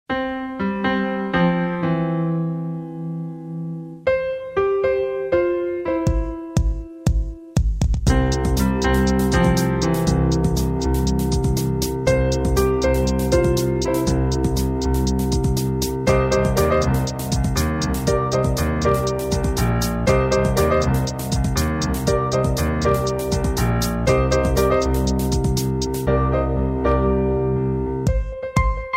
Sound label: Beautiful Alarm Melody Ringtone; ringtones